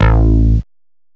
SYN BASS; M8; CMI Factory

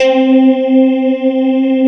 STRIA, Twisted Textures; STRYNGI

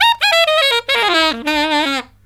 SAX, Killer Brass Riffs

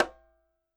CH cl h, KILLER CONGA

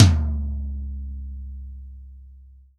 REAL DRUMS POP KIT

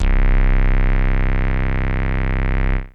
Big Synth Basses

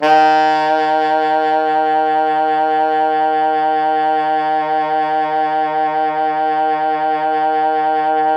Pop Brass D#2 L; Best Service ProSamples vol.19; AKAI

